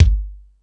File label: Hip House, drum machine